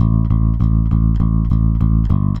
WORKING MAN'S BASS

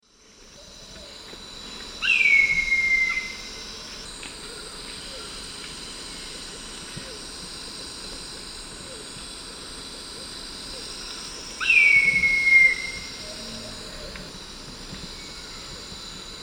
Leucopternis schistaceus, calls; S. America; Eastern Lowlands

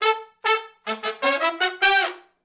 HORN CRAFT

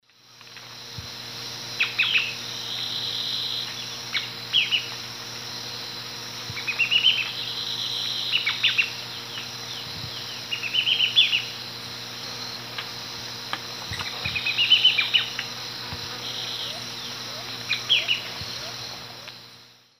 Phaeomyias murina, song and calls; S. America; Eastern Lowlands

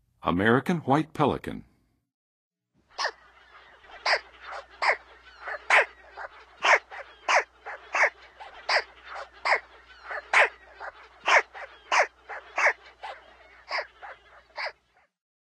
American White Pelican, Birds of North America; Western USA; Western USA Songbirds

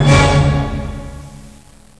OCTAVE C, ORCH HITS

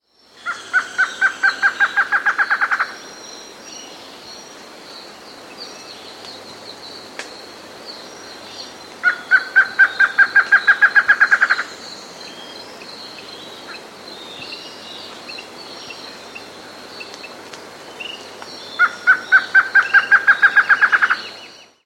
Sooretama Slaty-Antshrike; S. America

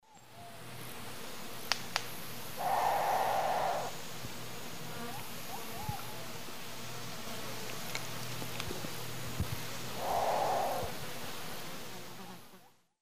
Asio clamator, threat calls female; S. America; Eastern Lowlands

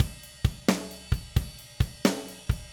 Drums, Rock

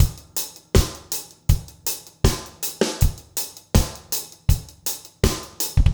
reggae

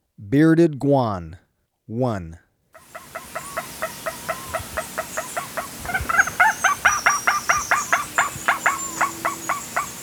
Bearded Guan, Birds of Ecuador; S. America

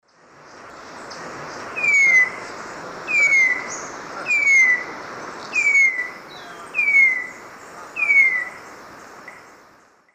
Vireolanius leucotis, song; S. America; Eastern Lowlands

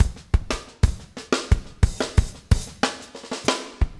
motown 120, loops 120bpm

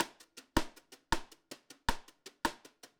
Drums, Jazz

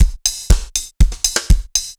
dancefloor tight 120; loops; 120bpm

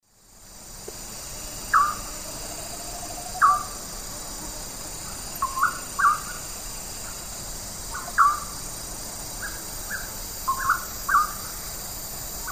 Nyctiprogne leucopyga, song; S. America; Eastern Lowlands

